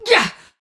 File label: Attack Effort; Warframe; Tau; Dax; Ninja